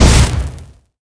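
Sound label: hit weapon bomb fire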